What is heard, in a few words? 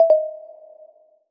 warning